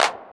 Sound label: claps, techno; Techno ID; single-sounds; single-drums